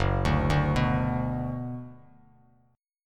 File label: F sharp Suspended 4th Sharp 5th Bass Standard, chord; arpeggiated; F#sus4#5